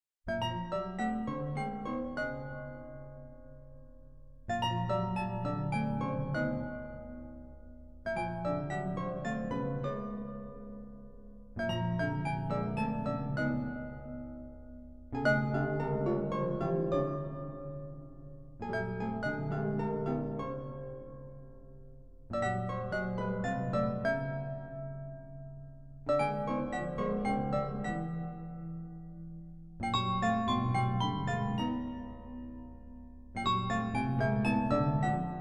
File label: Arpa